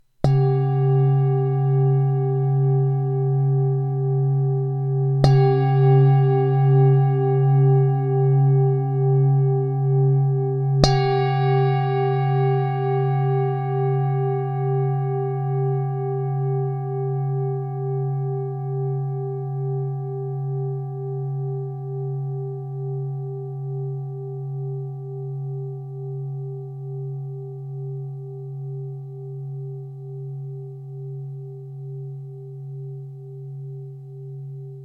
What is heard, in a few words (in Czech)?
tibetska misa; 21-5cm